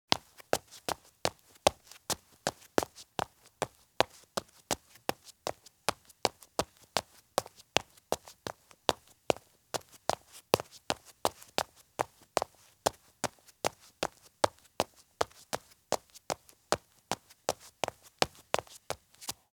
Small Toddler in Running Shoes Walk at Steady Pace on Concrete; Sound Ideas; Footstep Sound Effects